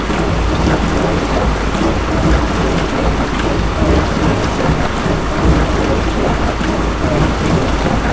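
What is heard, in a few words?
machine hum splash loop; Call of Duty Modern Warfare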